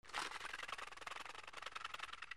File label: ANIMALS